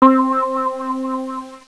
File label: synthesizer spring; Sound Effects for Multimedia - Volume 1; electronic sounds